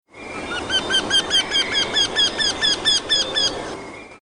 wendehals

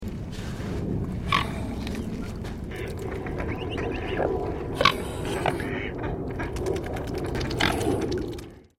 hoeckerschwan

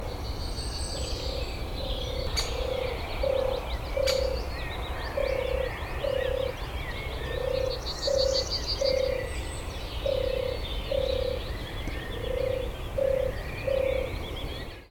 turteltaube

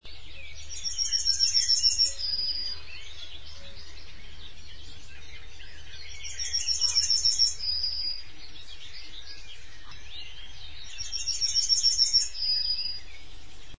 goldammer

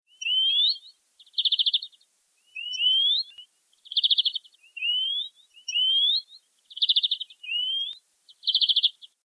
gruenfink

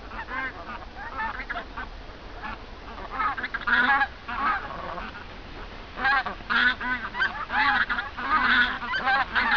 gaense

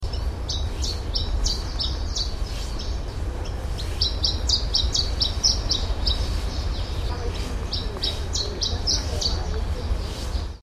canarenzilpzalp, vogelstimmen

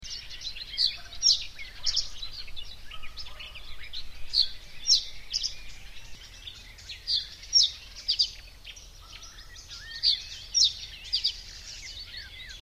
rohrammer

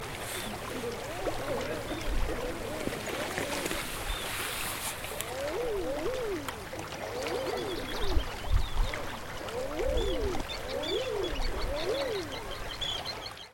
eiderente

